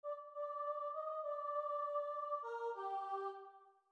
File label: chant